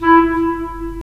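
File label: wind clarinet; LA Composer vol. 3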